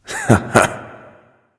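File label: non-verbal heh heh; smite; voicepack; houyi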